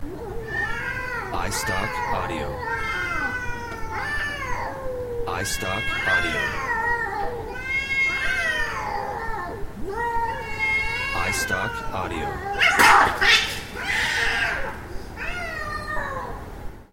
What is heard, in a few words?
cat fight